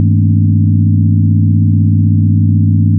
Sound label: ideal tympani